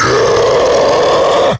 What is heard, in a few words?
rage